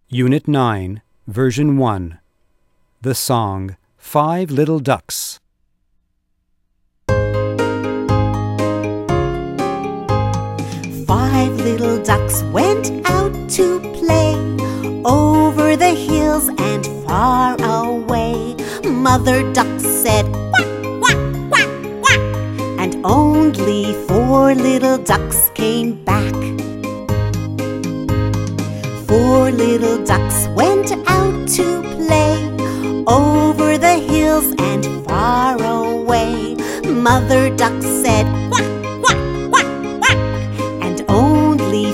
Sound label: five little ducks